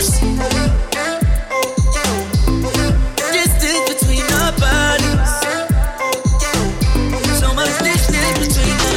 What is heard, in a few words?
funk; multitrax